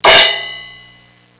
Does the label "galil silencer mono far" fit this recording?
No